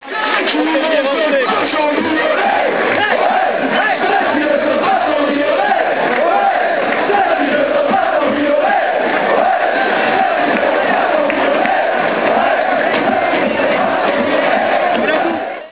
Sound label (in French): Ceux qui ne sautent pas sont Lyonnais, chants